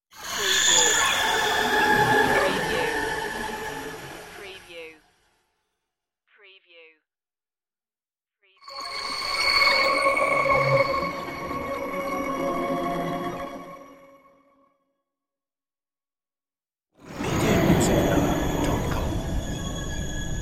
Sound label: high digital swooshes